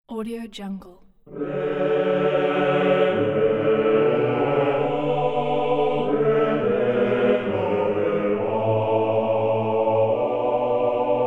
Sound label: cathedral choir